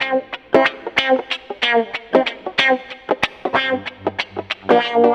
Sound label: Wah Guitar; Sonic Foundry (Sony Creative Software); Mac Money; Guitars